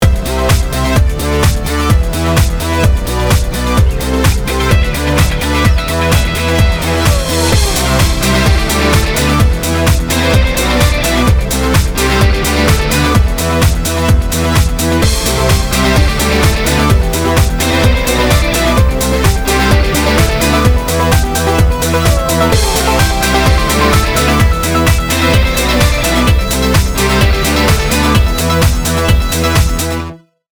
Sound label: ringtone dance; Proximus